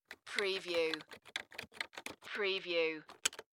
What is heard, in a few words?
Computer Keyboard